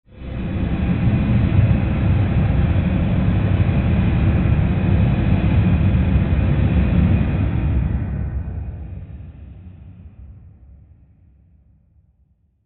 Swell Airy Rush Rumble Long; Sound Design; Swells

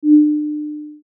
Beep Tone; Multimedia Web Interactive; Beeps (Sound Effects:Multimedia)

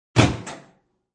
Popping open microwave door; Microwave Doors

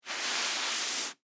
Rag Wipe Long, Foley; Cleaning; Household

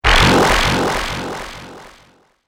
Laser Zap, Laser Gun

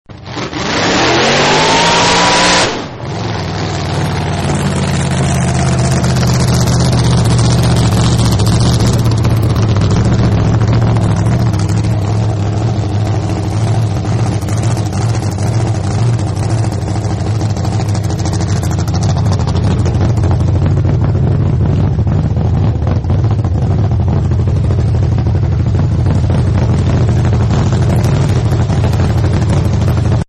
Hot Rod Comes Back, car; Hot Rod 1 Comes Back; Car Sound Effects; Vehicles

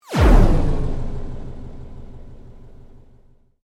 Sweep Mechanical Medium; Created sweeps, swooshes and other movement effects; Sweepers; Foundation4

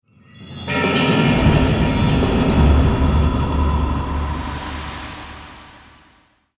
Drums Cinematic Stinger; Sonic Logo Sound Effects

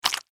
Horror Squish; Monster Sound Effects; Monsters (Sound Effects:Horror)

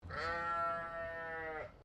ANIMAL SHEEP DISTANT BAA; Sheep recorded at Sovereign Hill Victoria

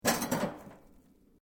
METAL SIGN MOVE; Sound Effects:Materials; Foundation3

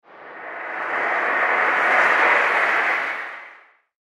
Slow Magic Gust; Magic Sound Effects; fantasy sound effect; Andrew Fleming

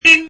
Funny Horn, Button sound; Buttons (Sound Effects:Multimedia)

